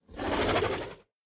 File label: zombie horse idle; Minecraft